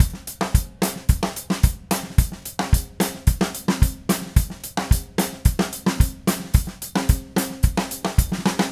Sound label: Red Maple Groove 110, DW Red Maple Kit